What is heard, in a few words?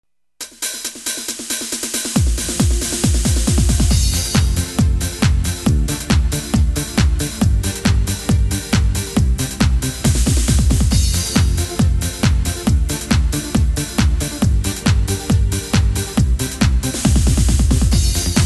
Techno; yamaha styles